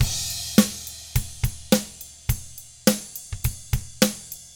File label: pop rock drums; 105POP ROCK